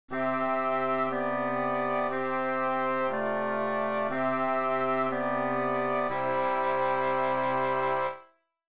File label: pedal